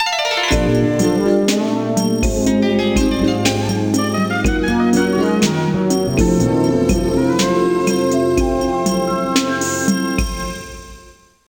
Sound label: Stage Clear; Gals Panic 3